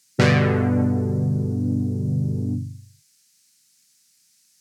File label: Brass; j106